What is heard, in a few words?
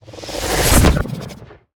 Ability Mimic Exit; Lurker; Evolve; Gorgon